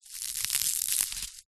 silica gel packet small squeeze; zapsplat; foley

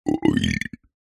human male burp vowels

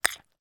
food spoon in glass bowl cereal wet; zapsplat

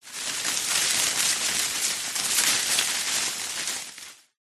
tree branch thick healthy leaves movement rustle

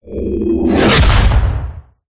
whoosh; Audio Mangler

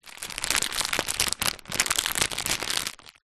food microwave popcorn packet plastic handling

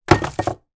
tin foil roll throw into drawer, household

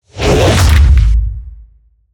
Whooshes Impacts Impact; soundbits

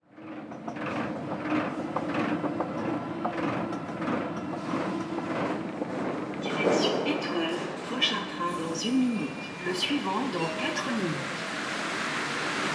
Subway, station, train; lilesound

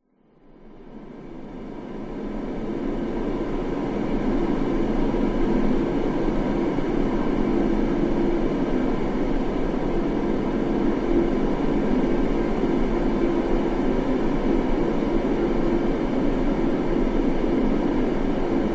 science fiction spacecraft engine idle whine

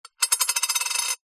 coin down on glass spin

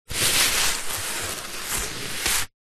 sport exercise mat foam hand rub

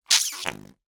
cartoon squelch fart

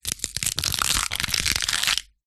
horror limp pull tear twist off body; zapsplat

